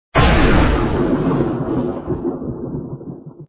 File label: lightning